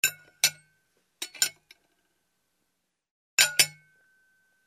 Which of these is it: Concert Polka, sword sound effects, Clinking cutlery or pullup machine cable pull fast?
Clinking cutlery